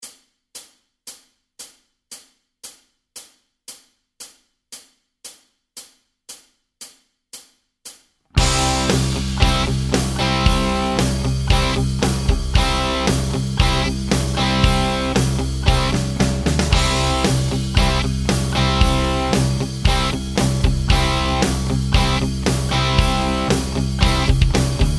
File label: Backing Track C Modes